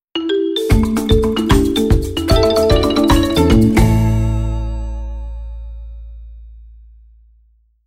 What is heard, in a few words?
JINGLE MARIMBA